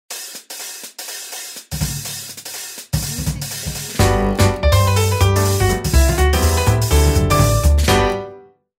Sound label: Fast Swing Trio Logo